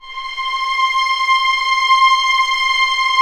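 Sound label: STR C5 PP, Orchestra Strings; Sound On Sound - Peter Siedleczek's Orchestra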